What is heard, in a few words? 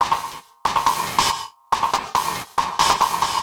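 Saver Loop, beats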